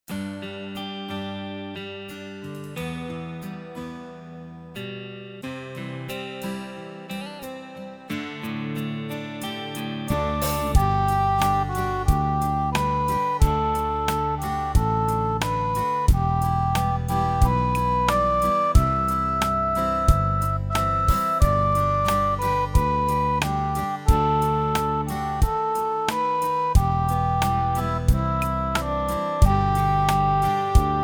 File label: Auld Lang Syne